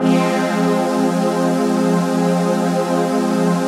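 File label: chord pad, Pads; Best Service; XX Large - Pads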